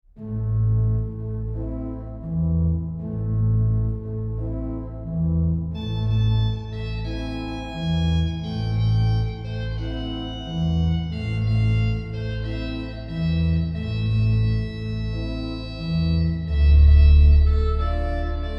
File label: habanera a moll, organist